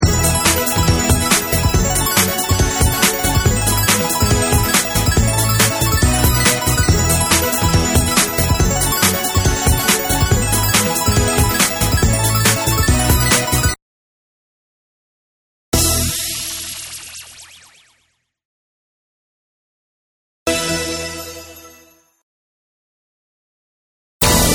Twin Spin Touch; twin happiness

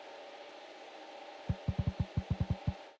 auto play spin; jackhammer 2

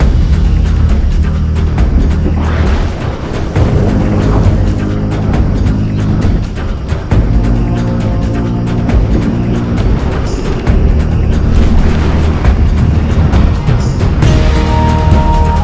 free Spin Amb; riches of midgard

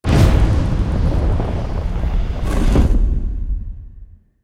free spin to main game; rise of maya

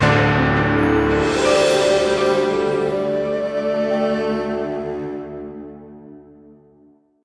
outro Free Spin; secret of the stones

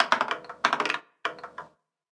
ball bounce, roulette

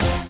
quick Stop Twin; twin happiness

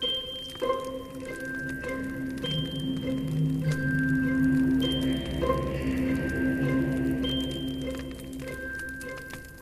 intro Free Spin Loop; lost relics

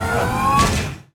television Up Down; eggomatic

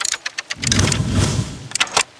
key Symbol Rotates; hiddencoinsofegypt

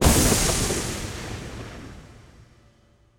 big Win Text Change; archangels

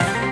sticky Win; jackhammer 2

